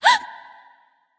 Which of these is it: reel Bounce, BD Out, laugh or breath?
breath